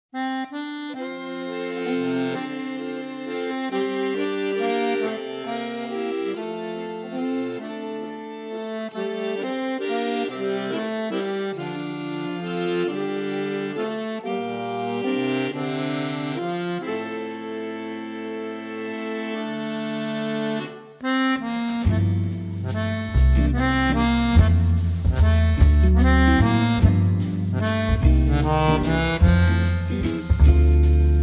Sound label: Waltz